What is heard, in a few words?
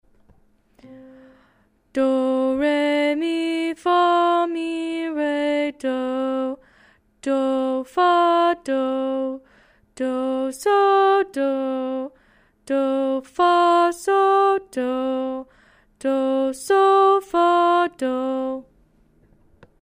Centering Song